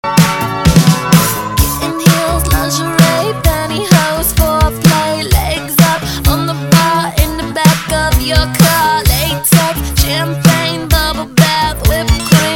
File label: Classic Party MashUp